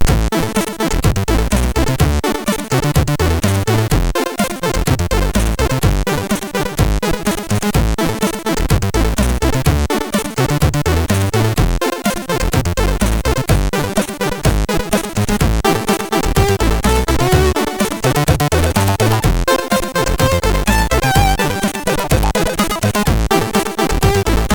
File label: sid MOS6581R2